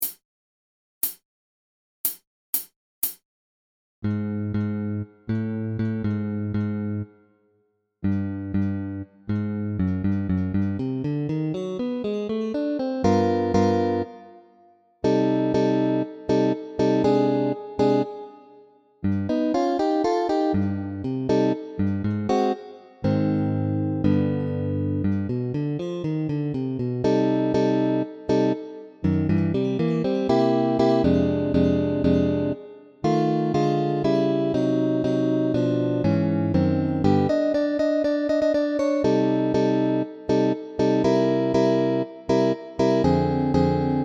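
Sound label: Tango guitar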